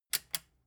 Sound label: metal button click power on fast